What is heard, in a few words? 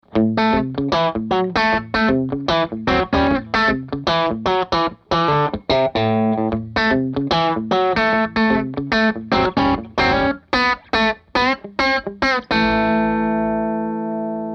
light od country rhythm, guitar